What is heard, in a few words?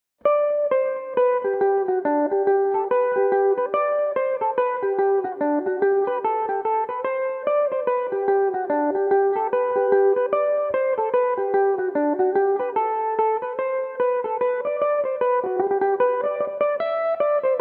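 G major, mandolin; Gan Ainm 5; Reel (4/4); Belmuse EM-190 electric mandolin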